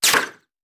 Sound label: Bullet Impact; Sound Ideas; Guns